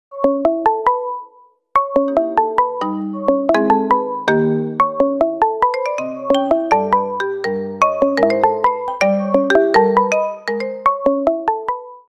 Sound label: Huawei Honor Tune Ringtone